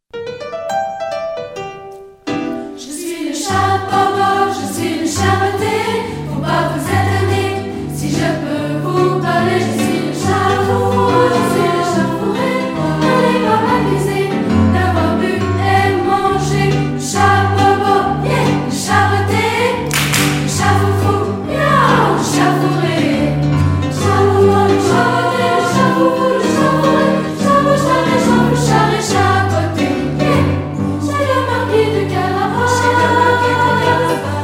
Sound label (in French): chanson du chat botte